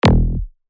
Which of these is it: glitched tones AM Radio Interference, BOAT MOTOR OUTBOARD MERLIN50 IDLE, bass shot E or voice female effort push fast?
bass shot E